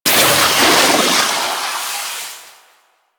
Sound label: Infested Mist Fissure Closed C; Warframe; Infested Eris; Encounters